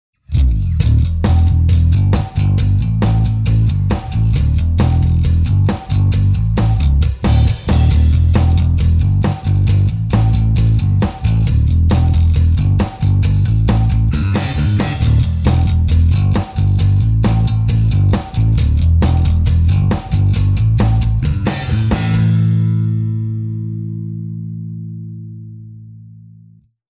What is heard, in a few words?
Act Rock Both; R&B fingerstyle on the Mosaic Std 5, both pickups, active mode